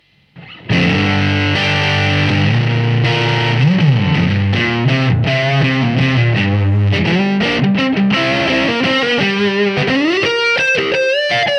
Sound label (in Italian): MIKE TELE NECK; MACBROS